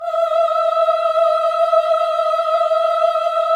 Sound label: VIB F E5B -L, Symphony of Voices; Spectrasonics